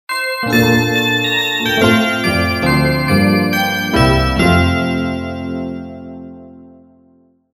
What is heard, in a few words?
logo xmas